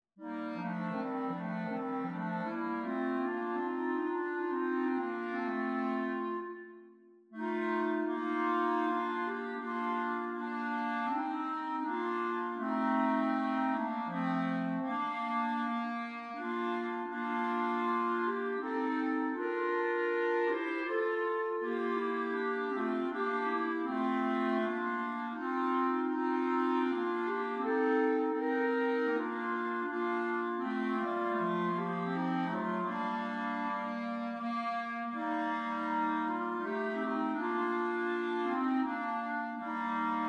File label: Clarinet Trio; We Gather Together